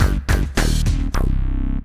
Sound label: titlescreen end stinger; Nickelodeon Basketball Stars 3; ThrillGames